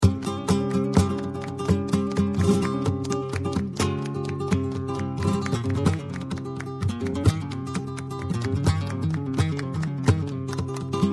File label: buleria, flamenco